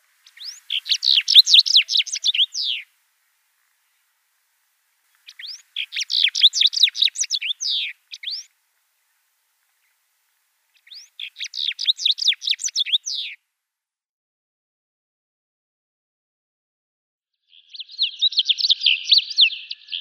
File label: House Bunting song